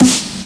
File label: SN Big; Multi Drum Loop 17